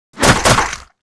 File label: battle Beak skill